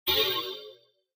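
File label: HF Electronic Spring Reverb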